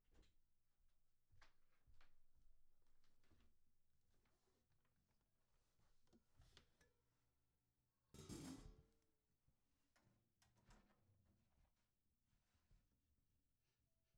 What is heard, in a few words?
perc intro hat